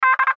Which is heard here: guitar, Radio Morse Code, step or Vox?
Radio Morse Code